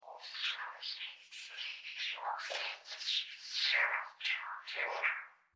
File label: Aliens; SQUELCHES